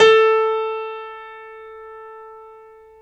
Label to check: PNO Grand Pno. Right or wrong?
Right